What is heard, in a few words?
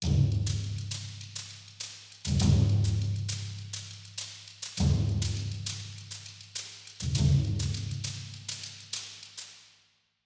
Drums; Necropolis